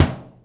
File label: bass drum L, Drum & Bass 180 BPM; Ueberschall; Drum & Bass Flash Trackz